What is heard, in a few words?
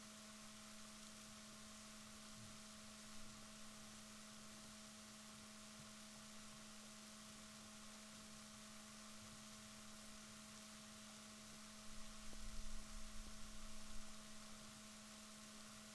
rorquals negatif, Balaenoptera Physalus Chile